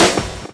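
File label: drum chop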